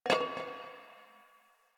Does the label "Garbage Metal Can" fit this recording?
Yes